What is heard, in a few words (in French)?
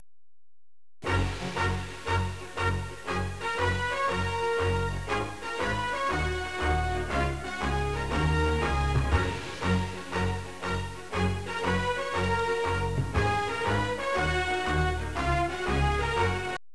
Musique